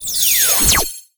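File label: Summon Synchro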